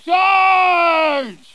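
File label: jet combat; CIVILWAR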